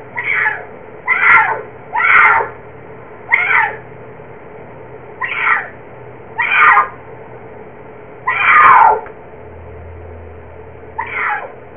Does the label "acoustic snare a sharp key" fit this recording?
No